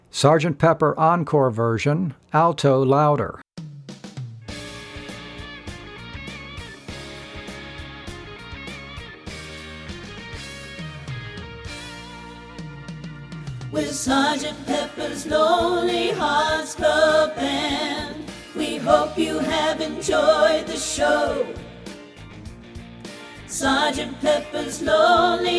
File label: ALTO LOUDER; practice tracks for singers